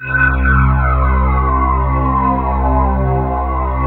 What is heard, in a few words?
SYN Morp C3; Best Service Gigapack 1; SYN MOR1